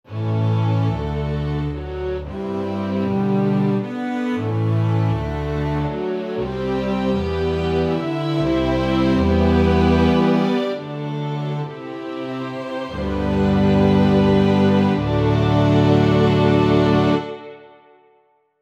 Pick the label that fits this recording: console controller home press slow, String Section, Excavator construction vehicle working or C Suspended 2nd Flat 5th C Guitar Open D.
String Section